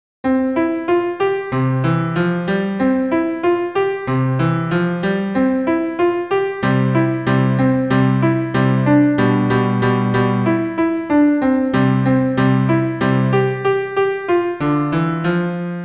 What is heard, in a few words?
When the Saints Go Marching In piano arrangement 188 bpm